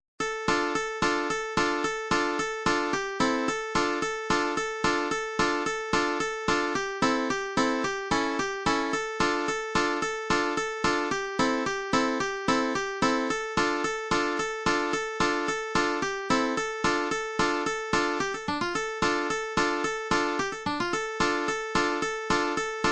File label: Blues, uke